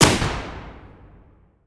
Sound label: aek906 shoot, weapon